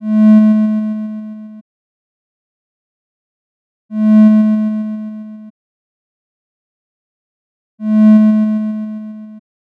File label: Bowed String